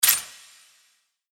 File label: click